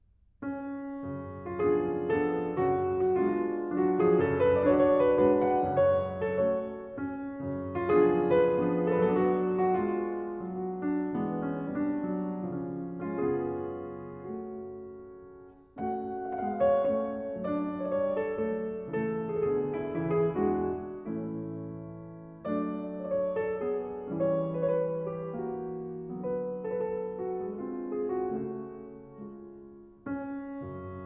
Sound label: Popular Melody, Piano; Lyric Pieces, Op. 12; Grieg